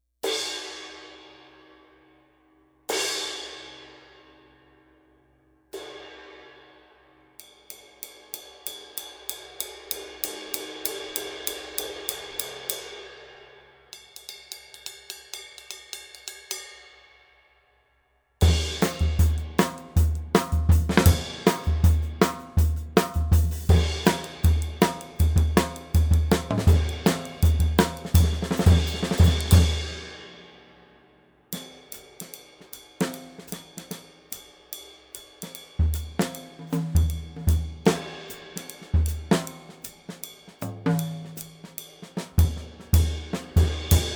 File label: Zildjian 20 K Dry